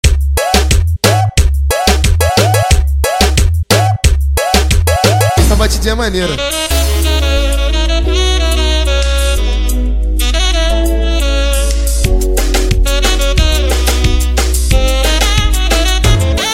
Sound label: Sax